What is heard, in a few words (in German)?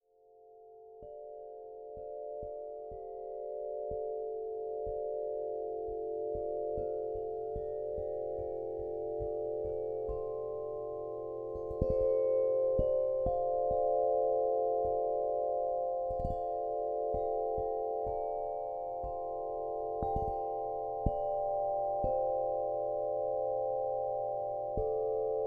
Klangroehren